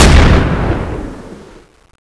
m4a1

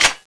mk3a1 clip in

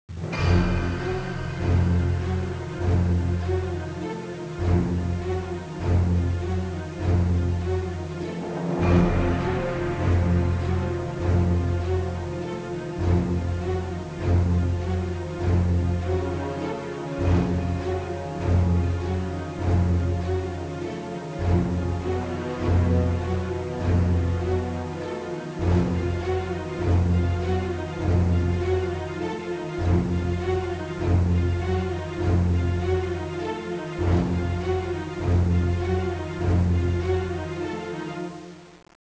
bomb planted; musickits, CT; csgom; cstrike

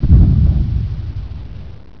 Bomb; de torn

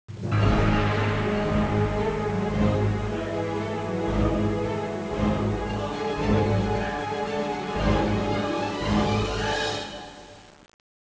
bomb ten sec count; musickits, CT; csgom; cstrike

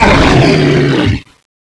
normal hurt, zombie; Zombie Darkness

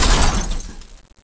debris

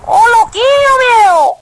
knife; cstrike

